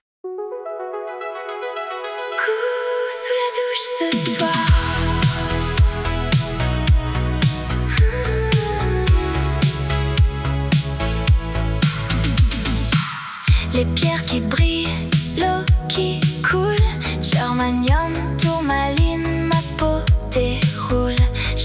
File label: synthwave; suno